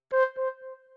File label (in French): ECHEC